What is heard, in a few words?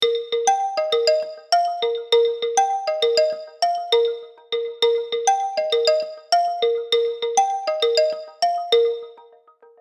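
standard call; lenovo jocosity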